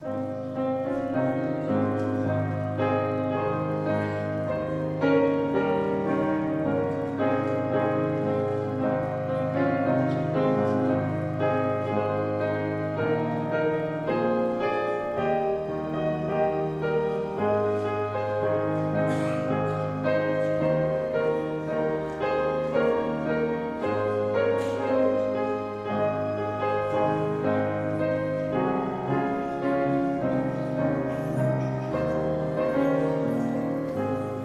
Vorspiel

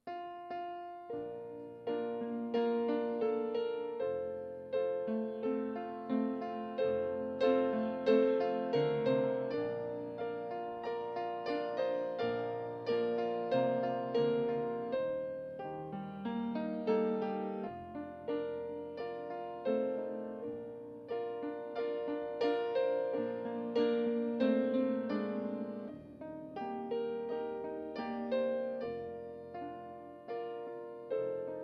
Vorspiel

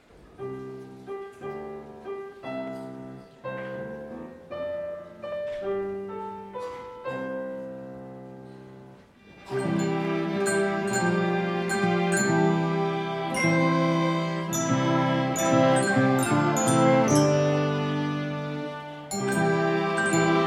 Kinderorchester

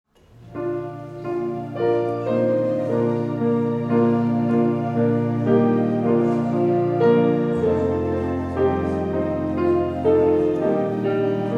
Vorspiel

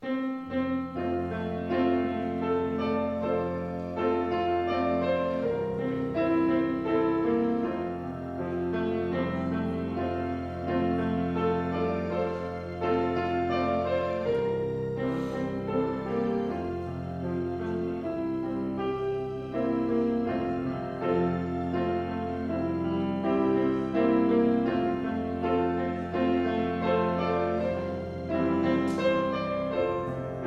Vorspiel

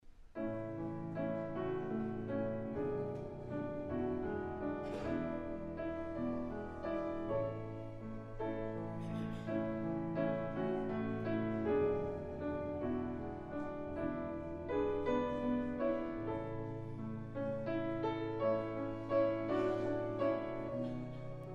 Vorspiel

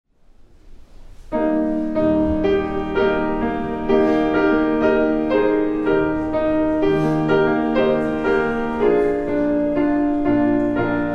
Vorspiel

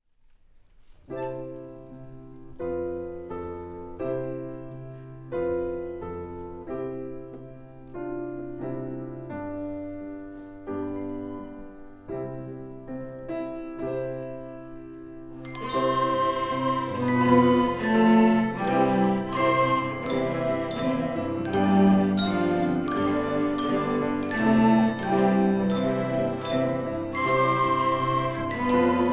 Kinder Orchester

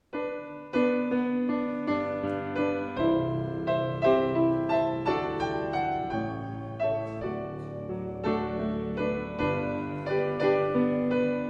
Vorspiel

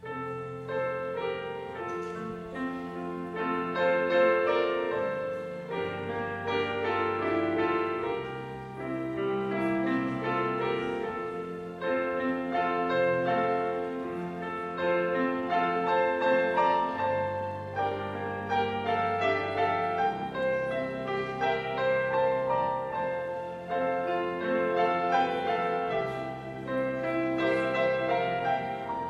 Vorspiel

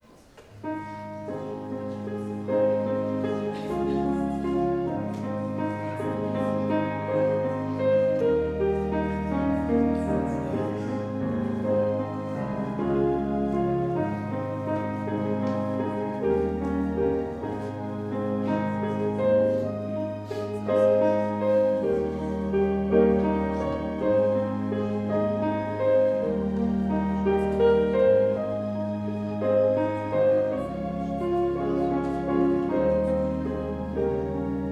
Vorspiel

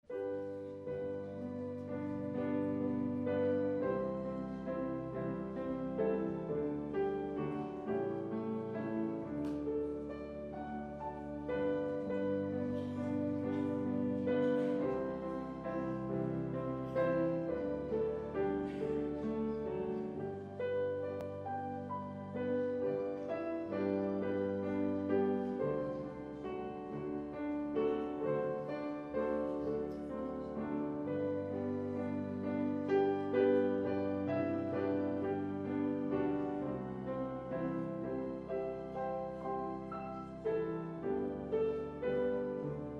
Vorspiel

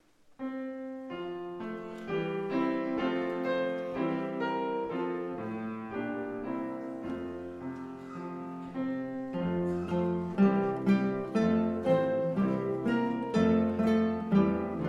Orchester

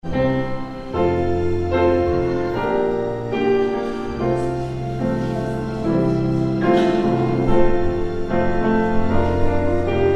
Vorspiel

